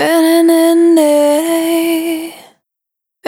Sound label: Vapor Dry, Single Voice Melodic Loops